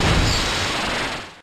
Fusion Flare

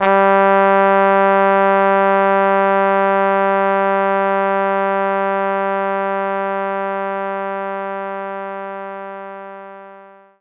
trombone G3; knights try

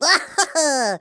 Cat Fly; Rabbids Big Bang; android

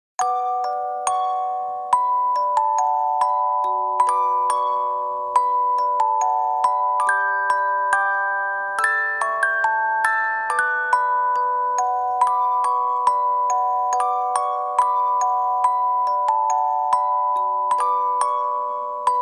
ORGEL VER.

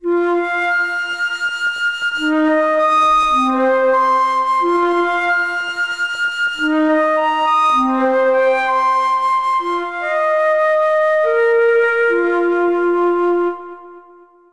musician song of meditation

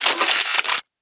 Weapon Reload Laser

